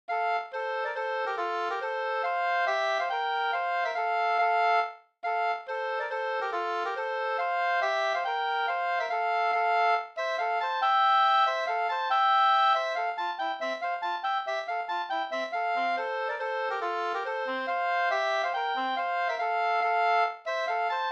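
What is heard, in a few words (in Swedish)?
polska, tvåstämmig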